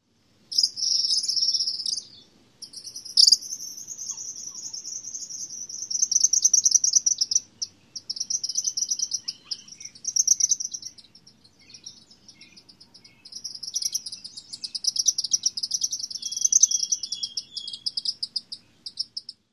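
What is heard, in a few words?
TROKIN calls num 2, tropical kingbird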